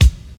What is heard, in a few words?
dry kick single shot c key, Royality free bass drum sample tuned to the C note.